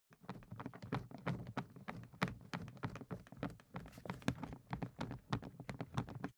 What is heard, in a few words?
briefcase plastic running movement; industrie wirtschaft; bueroarbeit